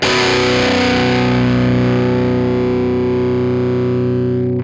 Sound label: GRUNG SUS4, Guitar; 90 s Sample CDs; Quantum Leap - Guitar & Bass